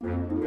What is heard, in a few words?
Comical Start 64bpm; asterix obelix slap them all 2; Music Dialogue